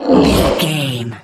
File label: troll monster effort action release